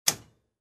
Compartment lock click sound

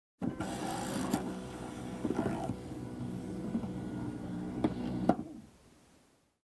Car part extending

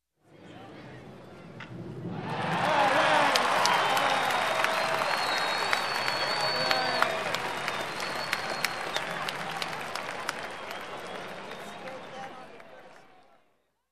Audience in a baseball game cheers

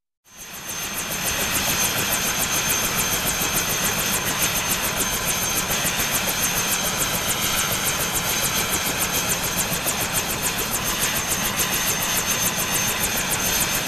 Helicopter engine shutoff on ground

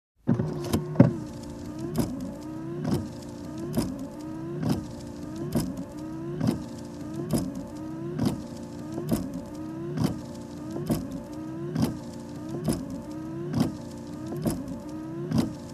Car Windshield Wiper

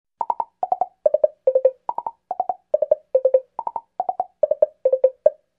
Woodblock rhythm variant

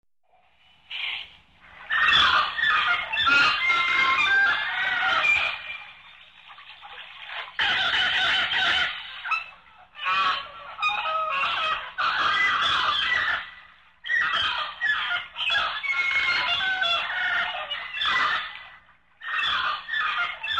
Wild Birds Howling

